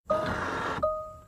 Tesla Lock Chime

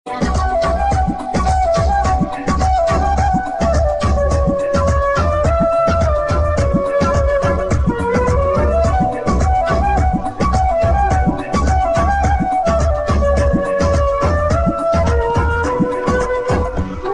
Flute Tune

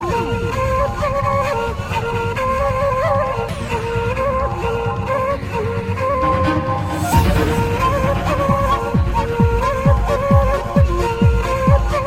Cool Music

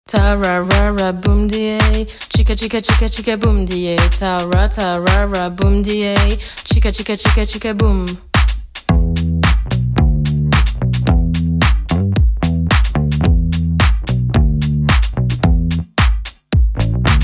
Boom chick

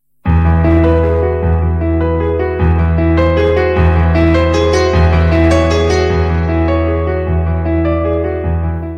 Instrument; Autograph

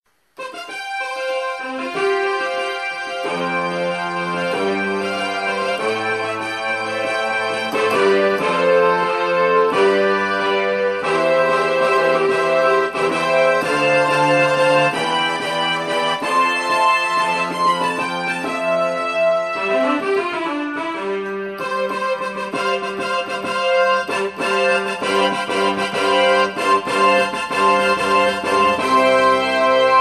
Piano improvisation

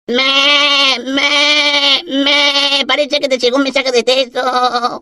Cute Sheep

